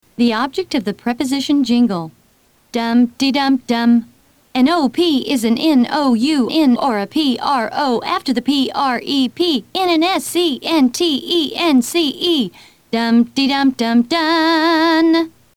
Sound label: object of the preposition jingle